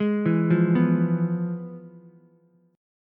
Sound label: F Minor Major 7th Flat 5th Ukulele; FmM7b5 arpeggiated; JGuitar